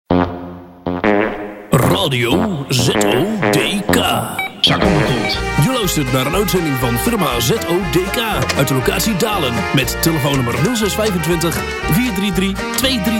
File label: Jingle; Audio Fragmenten